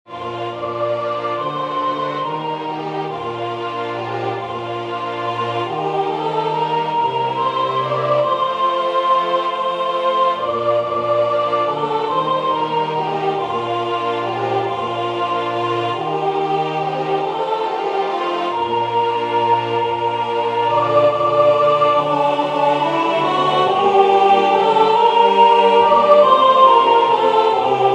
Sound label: SOPRANO